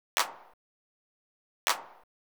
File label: 909 clap; hydrogen